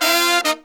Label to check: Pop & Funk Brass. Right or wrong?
Right